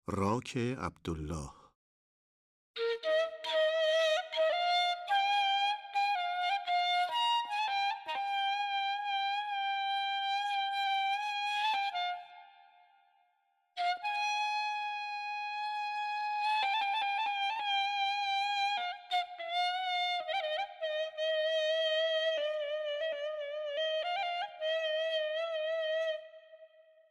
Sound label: Radif-e ney; Rak-e abdollah; Abuata; Avaz-e Abuata